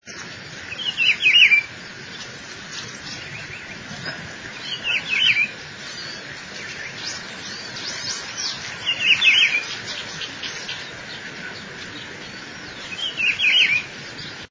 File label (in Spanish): voz juan chiviro